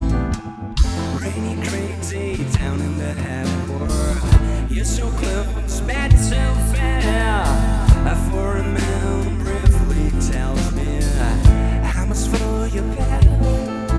backing

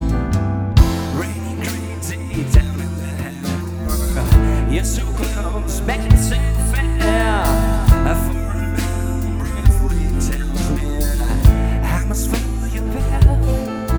bearlin roads, backing

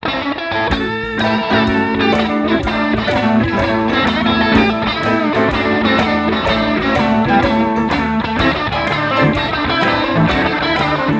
backing

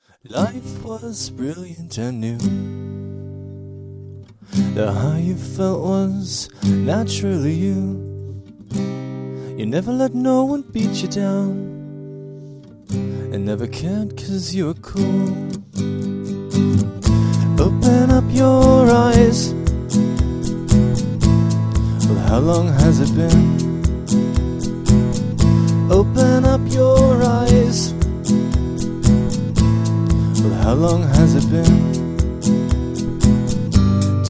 Noise backing

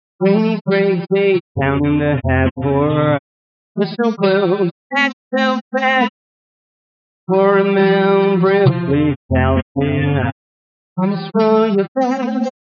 solo

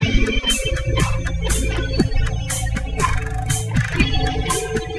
natural minor

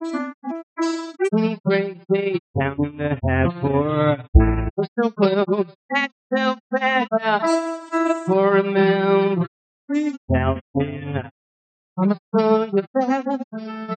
bearlin roads, solo

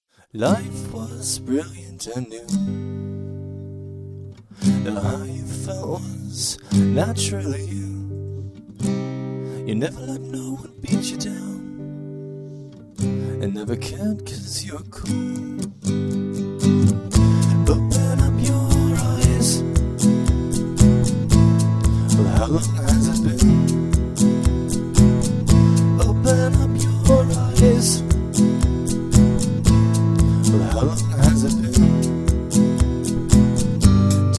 backing

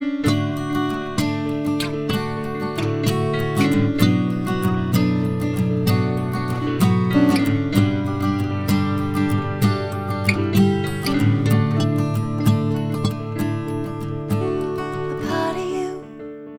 Seed backing

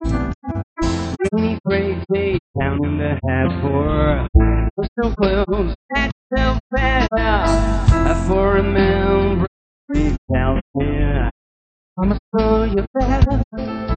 bearlin roads, solo

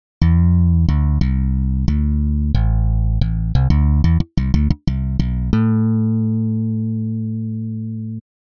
bass synthesis

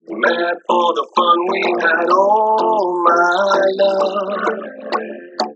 Music, Auralization

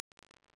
Imp Station, Reverb

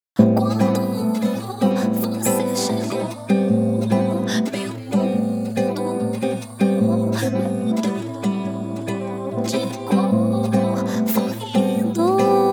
backing

